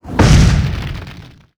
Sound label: Spiritblast; DarkLord